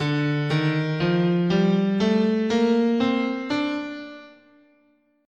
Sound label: D Phrygian, guitar, scale